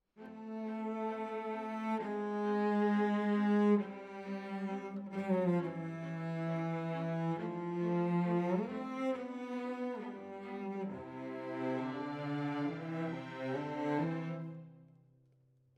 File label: Cellos